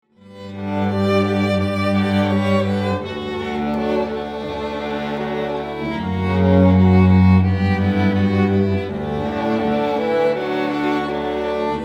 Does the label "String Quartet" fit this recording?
Yes